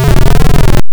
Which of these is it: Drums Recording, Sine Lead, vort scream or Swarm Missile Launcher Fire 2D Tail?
vort scream